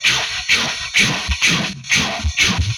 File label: Train Loop, Vehicles; retro arcade